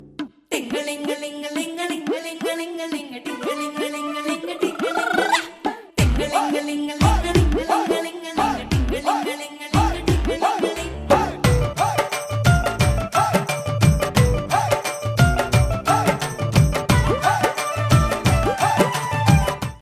instrumental ringtone; rockstar 2011

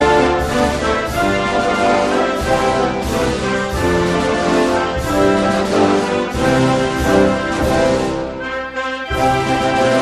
national anthem instrumental ringtone

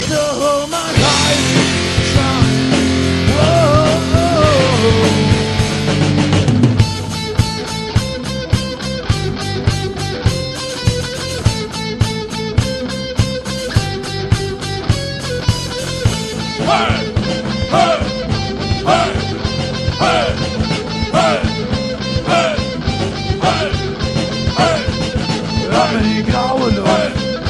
german rock, ringtone

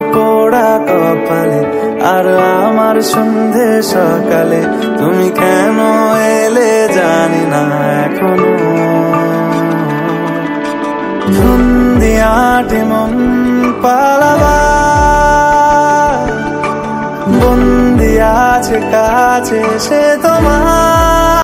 song ringtone

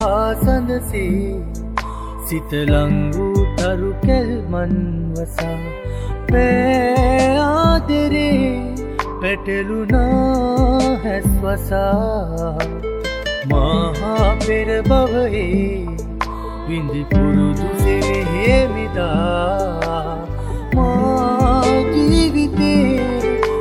slow sri lankan ringtone

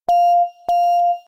latest notification trend ringtone

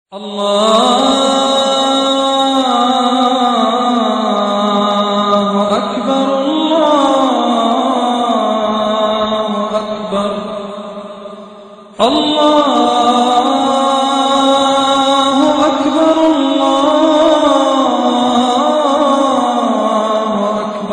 arabic prayer

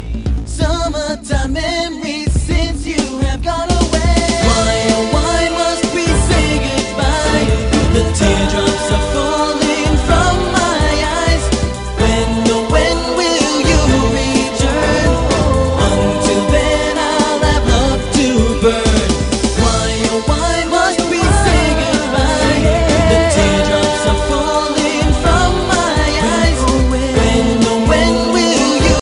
latin freestyle ringtone

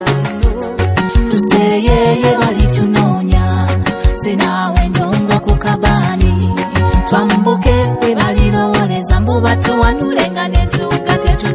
ringtone

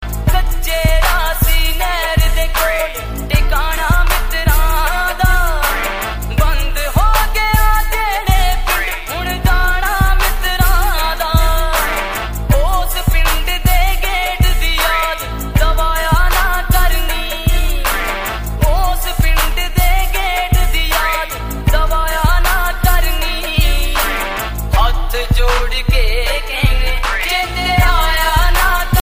remix punjabi ringtone

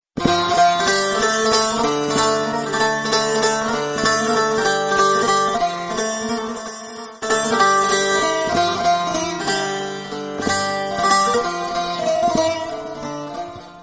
best turkish tone

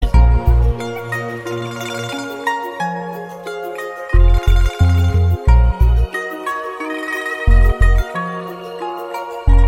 chinese guitar

